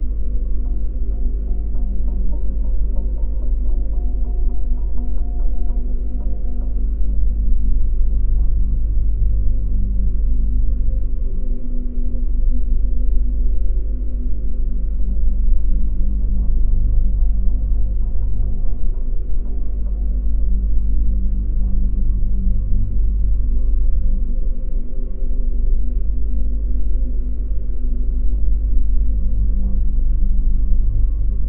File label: AMBIENCE SOUNDSCAPE LOOP, SCI-FI; PMSFX; Foundation Series